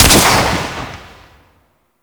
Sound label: Swarm Missile Launcher Fire 3D; Warframe